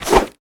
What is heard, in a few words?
trade up envelope slide out